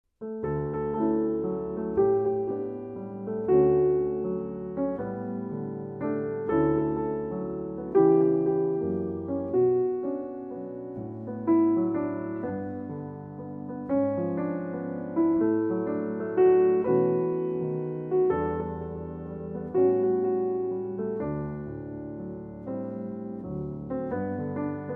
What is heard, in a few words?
Solo Piano